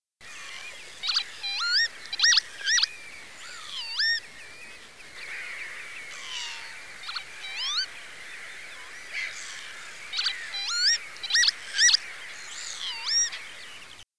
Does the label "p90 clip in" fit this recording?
No